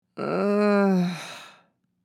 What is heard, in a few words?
Woman light moan headache